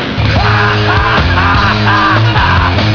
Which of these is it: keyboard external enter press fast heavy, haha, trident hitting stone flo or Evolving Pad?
haha